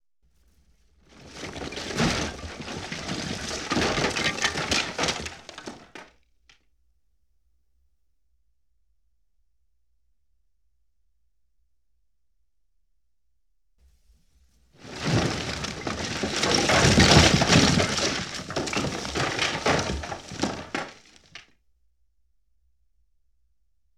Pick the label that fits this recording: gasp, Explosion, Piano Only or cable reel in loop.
Explosion